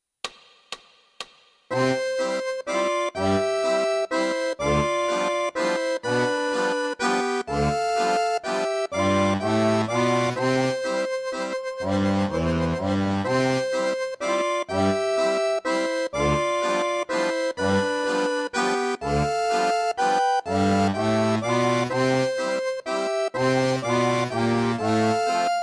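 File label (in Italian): Racconto alla Nonna, Fisarmonica 2 voci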